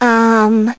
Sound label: ummm; twewy; uzuki